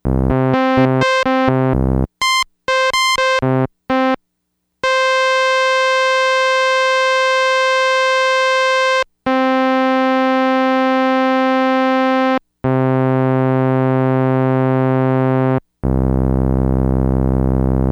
FM SAWTOOTH, Yamaha DX100; Factory Patches

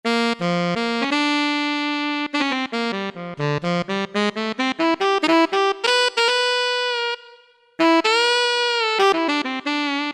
Alto Sax, Roland SC-50 Sound Canvas; Factory sounds

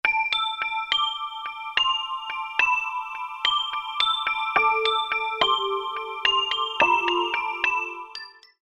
Detune Bell, Roland U-110; Factory Patch

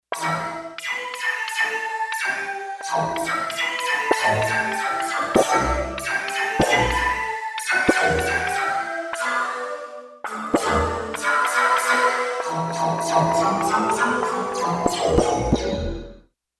Bam Bells; Digital Synthesizer

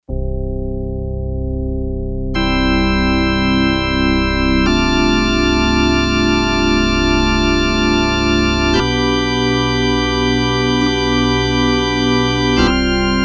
PipeOrgan, Yamaha TX802; Factory Patches; Voices

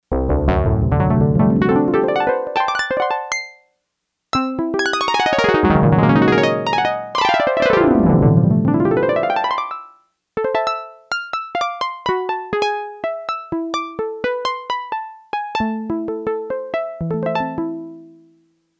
Harp, Yamaha TX802; Factory Patches; Voices

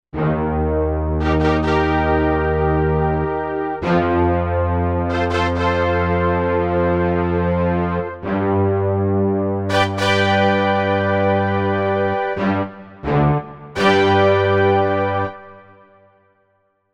Orch brass

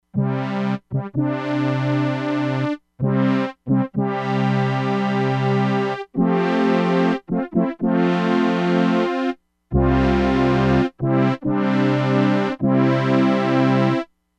ENGLISH HORN, Crumar Bit 99; Factory Programs

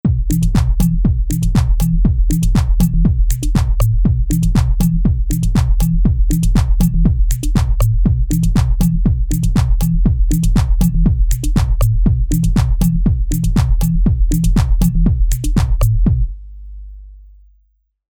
Techno

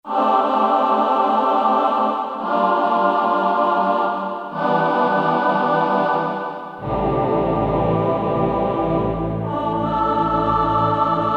VOICES, Korg DSS-1

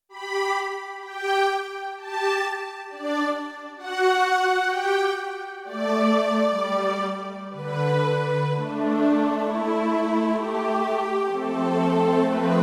Strings Vox, Roland D-70; Factory Performances

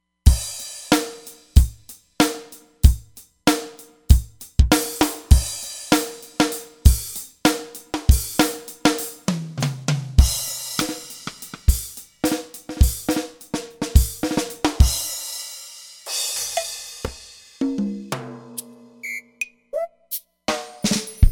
Studio X Kit; Drums

